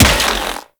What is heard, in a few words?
Infested Rifle Charged 2D Shot; Quanta; Weapons